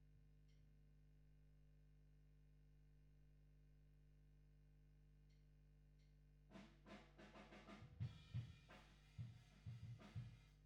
GTR B

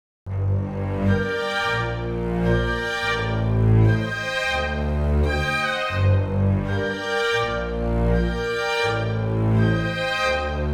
Strings WW

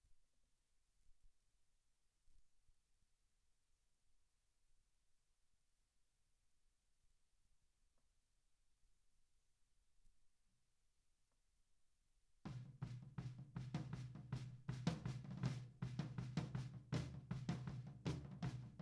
Snare Top, 160 BPM

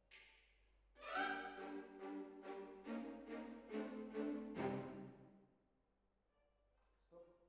Hall

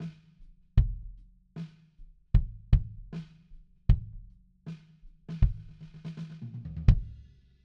BD IN

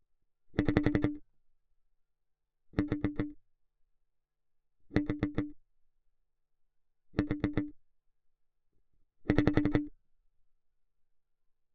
Bass Mute

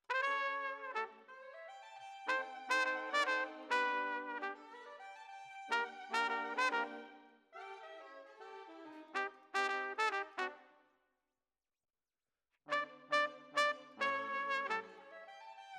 Trumpet

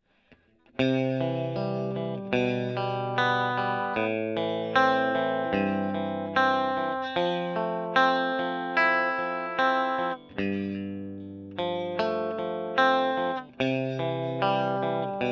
E.GTR; Gefell

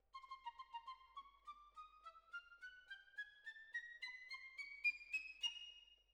Violins Over

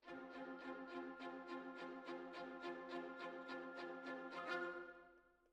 Violins, jazz for piano

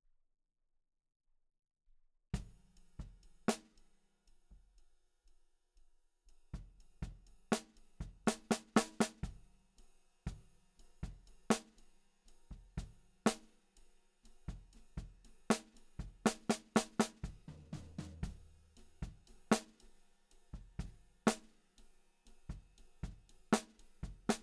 Snare Bot

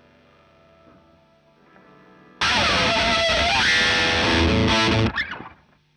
Marshal Gtr

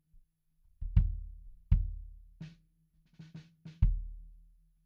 BD In

